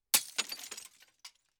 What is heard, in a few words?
glass breaks; شکستن شیشه کوچک; transitions and movement